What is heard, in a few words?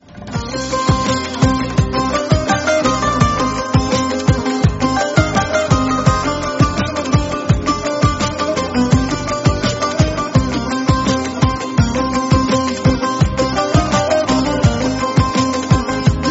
samsung galaxy c9 pro ringtone